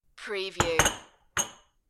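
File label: Stack Glass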